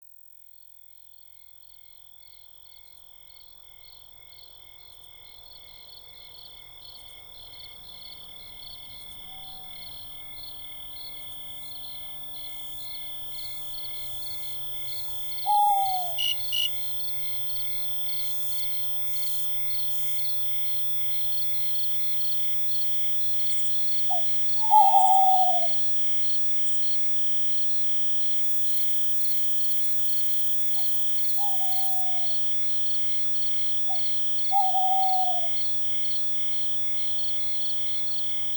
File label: Owl Forest; Meditation; RobinHood's AS Sessions